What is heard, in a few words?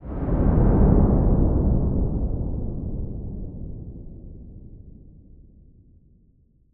deep explosion; torpedo